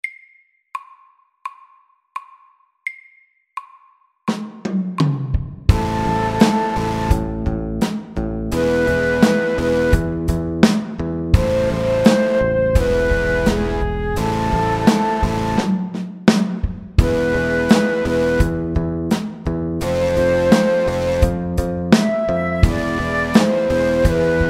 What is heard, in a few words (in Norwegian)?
Elektrisk rock SAKTE